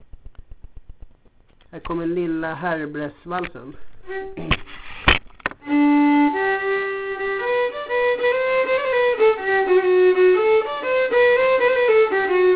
Lilla härbresvalsen

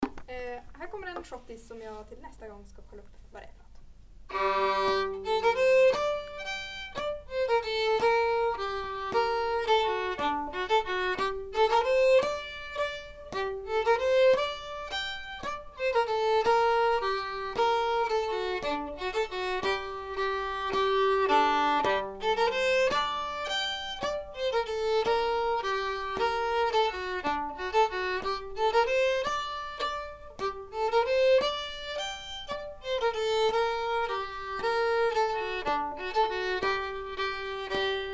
Sckottis; efter Alva

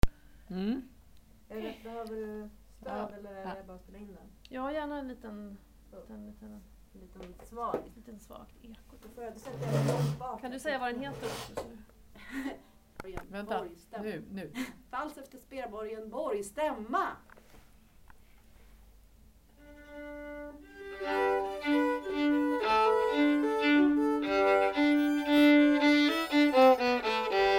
Stämma vals; spelborgen borg

